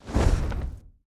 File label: wings, enderdragon; mob; Minecraft; Votive World